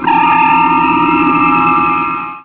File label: end level; pang